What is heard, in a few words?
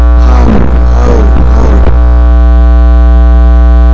howl